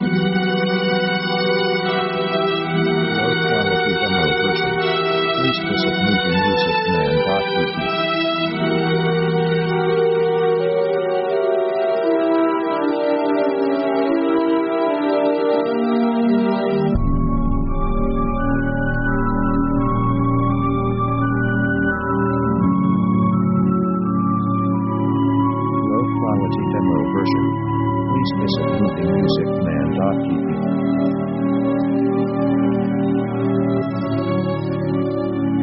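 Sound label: orchestral american drama sketch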